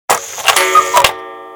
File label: music clock bird, alarms; Samsung; Galaxy S GT-i9008; ophone